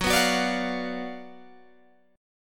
F sharp Major 7th Suspended 4th Sharp 5th, F sharp, down, Guitar, Open G